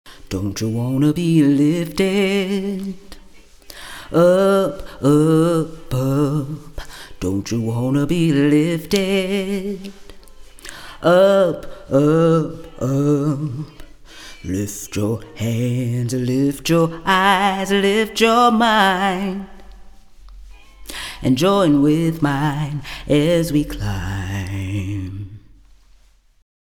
BASS, choir; The Freedom Choir

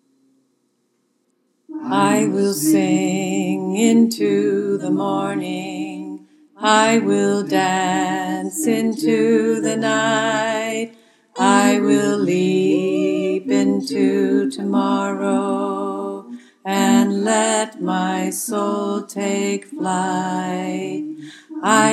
Tenor

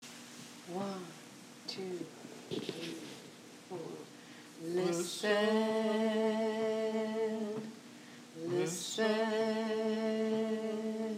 Song soprano, choir

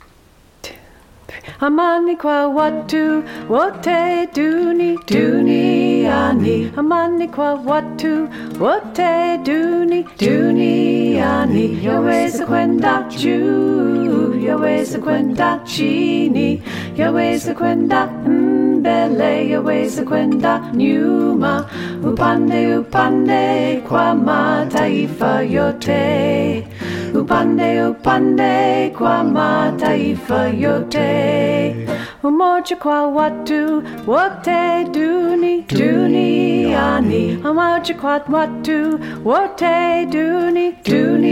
All Tracks Mix, choir

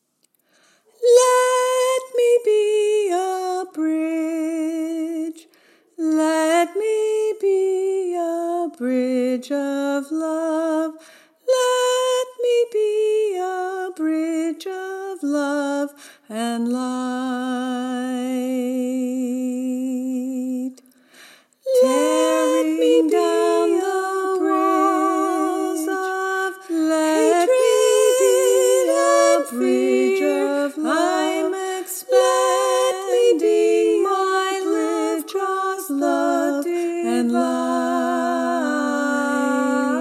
all parts, choir

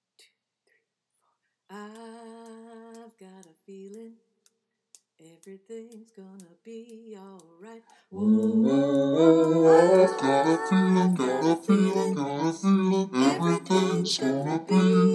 Bass, choir

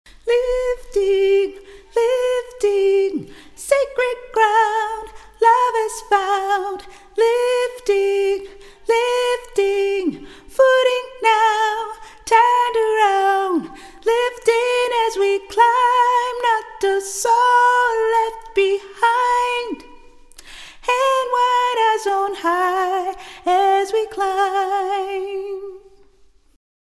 SOP, choir; The Freedom Choir

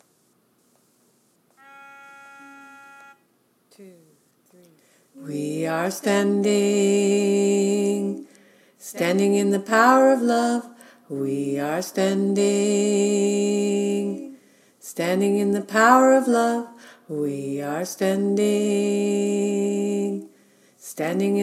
Tenor, choir; The Freedom Choir

